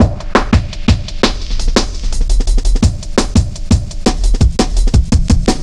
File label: 170, Drum Loops, Drum & Bass